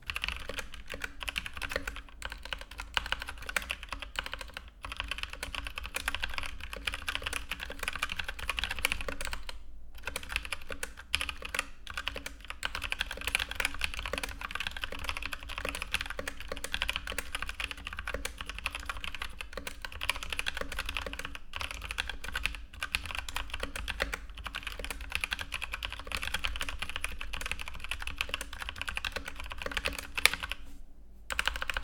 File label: Logitech G413 SE TKL soundtest